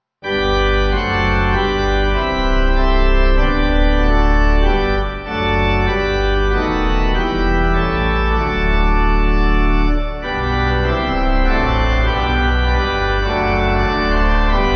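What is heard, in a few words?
Pipe, Pipe Organ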